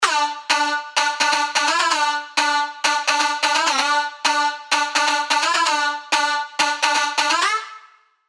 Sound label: dirty dutch leads; Bingo Winners; Revealed Spire Dirty Dutch Leads Vol. 1